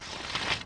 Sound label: scroll; BG2; Msstyles; Styles visuels